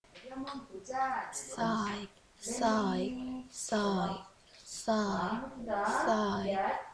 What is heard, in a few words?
sigh